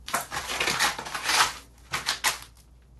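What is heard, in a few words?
footwear; ノベルゲーム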